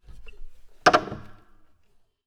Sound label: Music Stand, Piano; Piano Miscellaneous